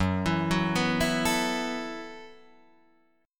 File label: Gb Minor 11th Gb Guitar DADGAD